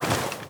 weapon movement sprint; weapons

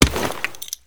draw grenade; weapons

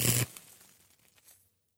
stunstick impact; weapons

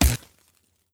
stunstick flesh hit; weapons

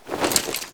draw physcannon; weapons

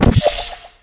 portal gun fire red

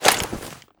357 deploy; weapons

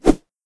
crowbar swing; weapons

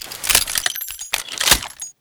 357 reload dual; weapons

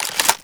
shotgun reload; weapons

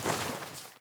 weapon movement walk; weapons

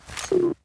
gravgun deploy; weapons; physcanon

